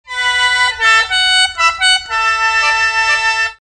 Sheng

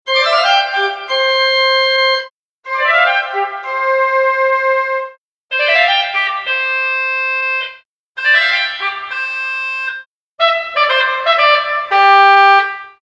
organ timbre

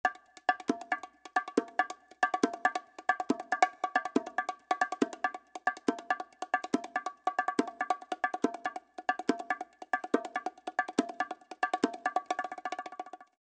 bongos solo

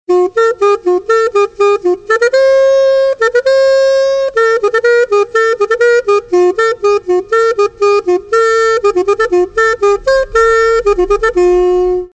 chalumeau solo

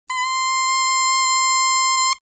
oboe reed

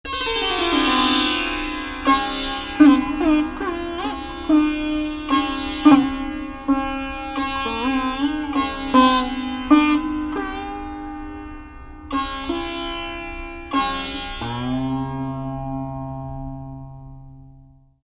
Sitar solo

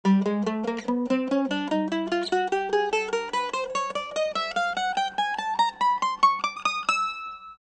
mandolin

